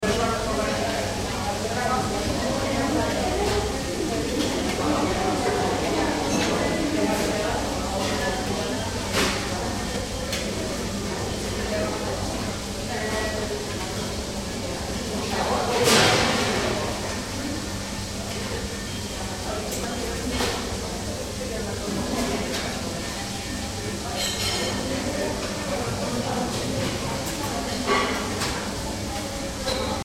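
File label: atmo germany berlin fish restaurant; nordsee fisch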